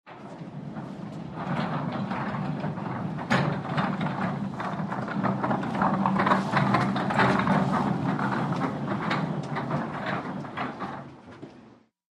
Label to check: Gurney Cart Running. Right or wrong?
Right